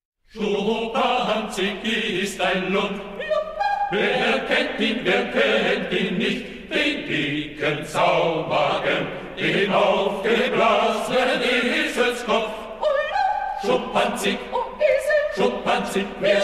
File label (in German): musikalischer Scherz für drei Solostimmen und Chor; Lob auf den Dicken